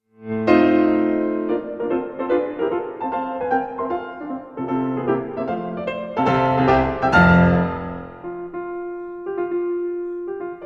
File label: sonata no 28 in a major op 101 for piano 2nd movement allegro molto; ludwig van beethoven